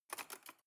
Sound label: cutlery cake fork drawer grab